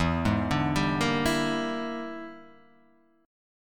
E 7th Sharp 9th Flat 5th Guitar Half Step Down, chord